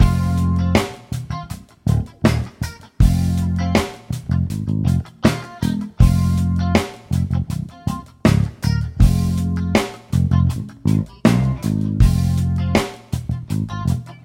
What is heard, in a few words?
solo loop